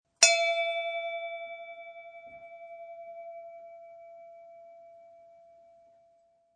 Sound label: Bell Ring Single; Bell ringing; Bells (Sound Effects:Musical)